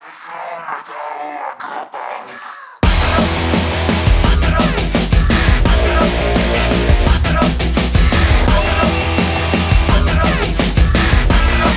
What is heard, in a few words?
metal agro punk